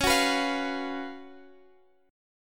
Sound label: Gb Major 7th Suspended 4th Db, guitar